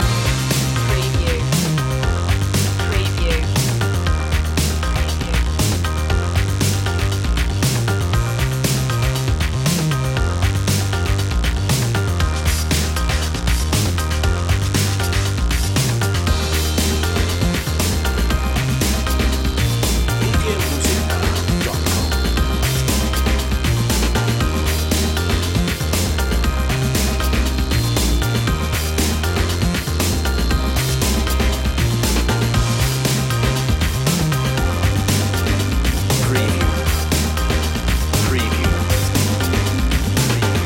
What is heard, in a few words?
underscore loop